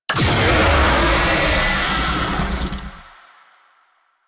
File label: Infested Oni Vocal Player Spotted; Warframe